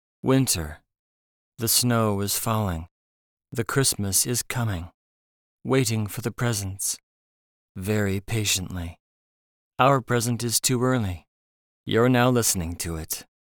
Vocals

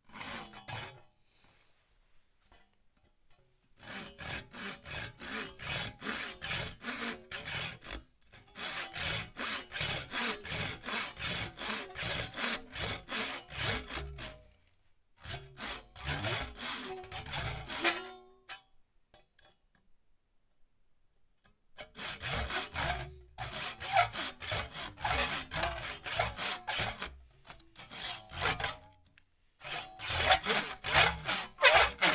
Sawing Wood

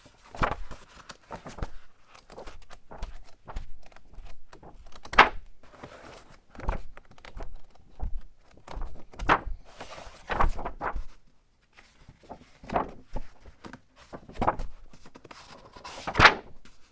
Searching In Old Book Looping

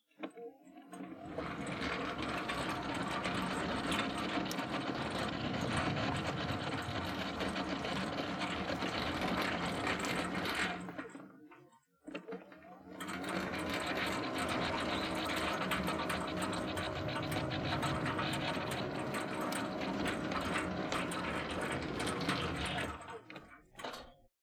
Electric Gate

